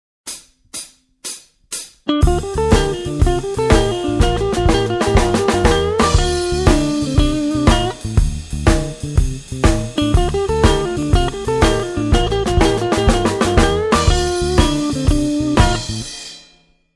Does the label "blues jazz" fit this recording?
Yes